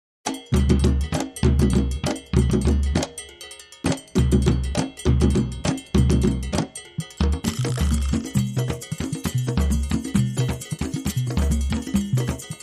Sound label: Master Of The Drums